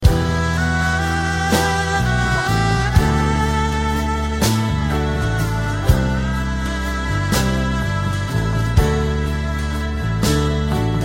Sad Music

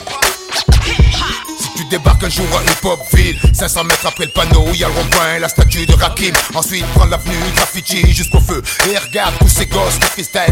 Hip Hop

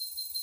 Alert; OV Omnitrix

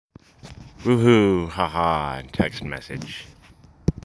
Dull woohoo

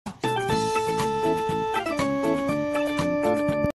Pipe Organ

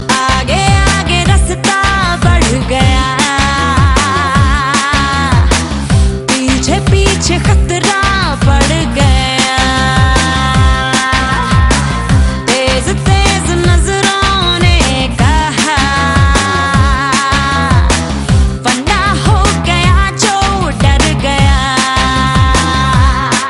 Female Version; Tezz

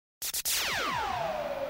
Lazer Msg

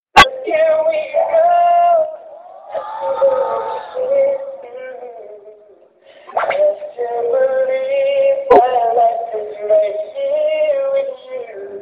Singing

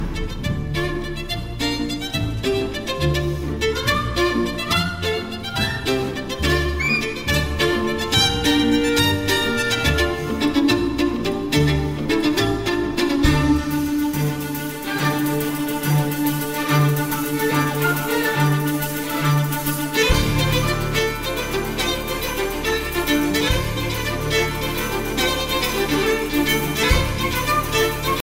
Caribbean

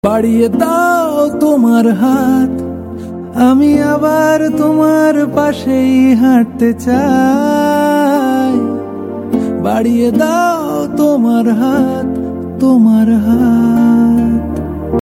Bengali

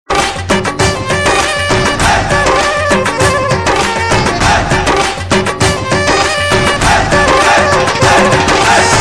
instrument

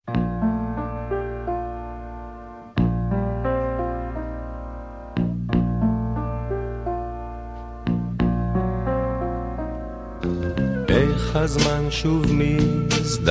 Sad Song

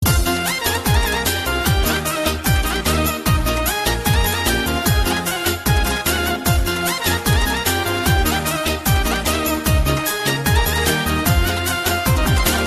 Beautiful Ring

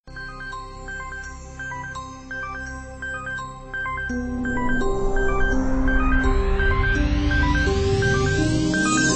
Sweet Sound

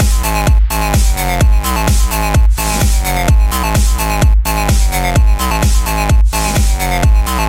Electric house

